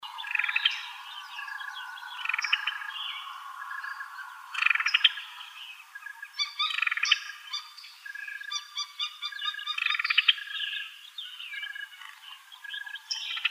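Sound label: Summer Tanager calls, Tanagers; SUTA; deviche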